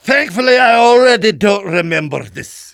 jeers; Demoman

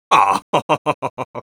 laugh evil, es; Risa diabólica

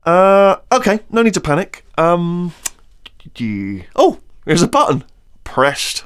sapper hacking; Wheatley

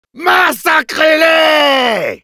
Demoman battle cry, fr; "Massacrez-les !"

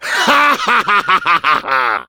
Demoman laugh evil, fr

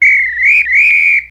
Whistle

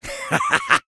laugh evil; Rire sadique 1; Medic; Robot Medic

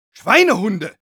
Medic negative vocalization, de; Schweinehunde!

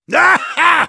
laugh short, Heavy, de

